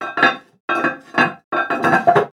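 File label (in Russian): toilet cistern lid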